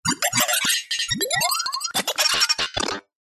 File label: science fiction cyberpunk robotic glitches